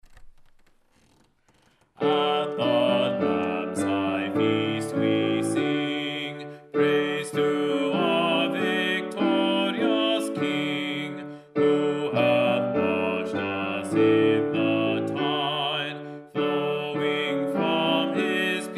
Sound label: Bass; At the Lamb’s High Feast; Selected Gregorian Chant & Hymnody